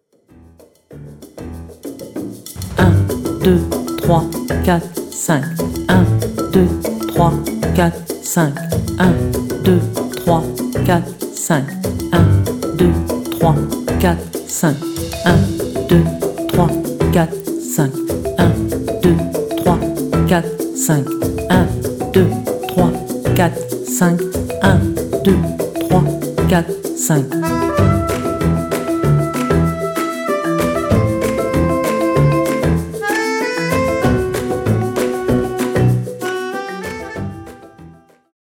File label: Boys knocking on a Piano